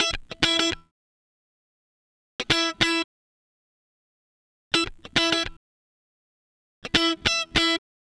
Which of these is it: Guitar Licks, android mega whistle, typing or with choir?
Guitar Licks